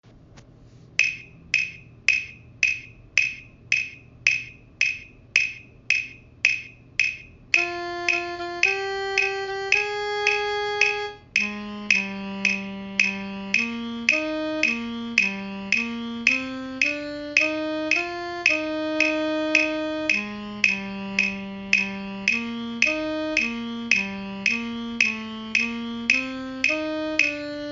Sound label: alto sax